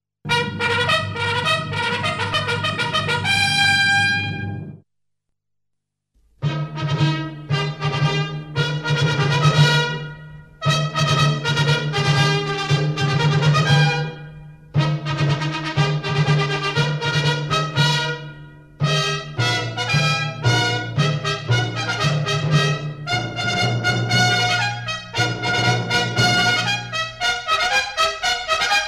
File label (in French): Marche Cuirassiers; Napoleon; hymnes Napoleon